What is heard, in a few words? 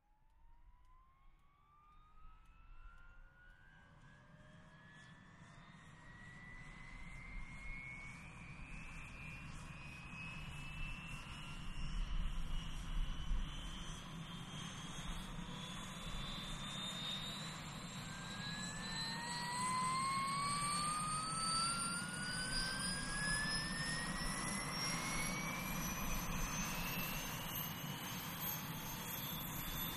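Helicopter Main Engine, huey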